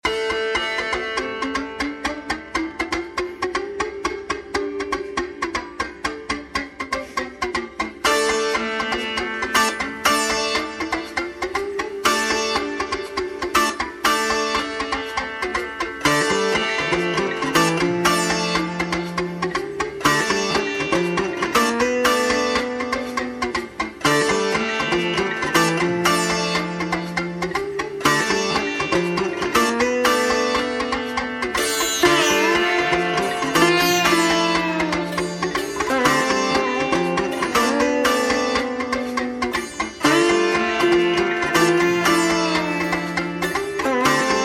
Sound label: Sitar Song